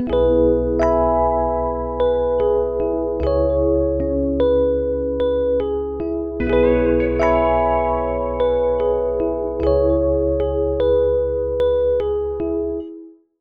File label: Melody Loop, 150 BPM E Maj; Humble Fearless Melody Loop; Cymatics - Humble Hip Hop Sample Pack